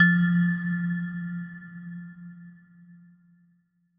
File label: bell